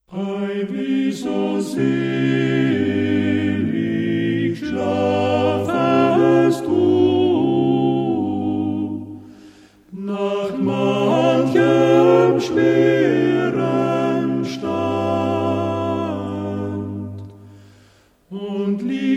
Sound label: quartette